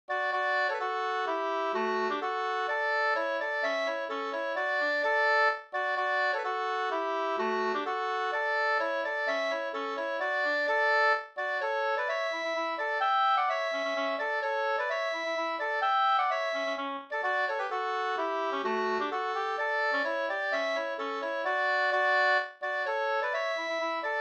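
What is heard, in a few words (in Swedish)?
polska, tvåstämmig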